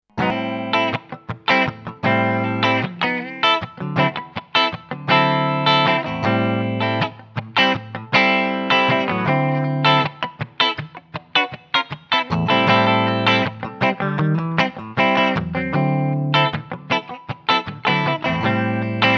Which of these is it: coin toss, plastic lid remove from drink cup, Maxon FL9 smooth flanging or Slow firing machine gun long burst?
Maxon FL9 smooth flanging